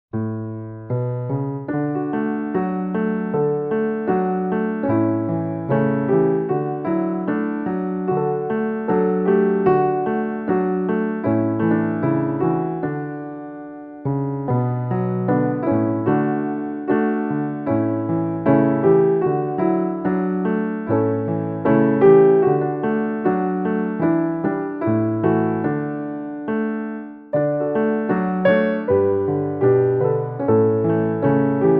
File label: Piano; Watts Cradle Hymn